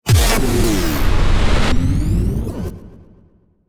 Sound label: Low Cinematic Impact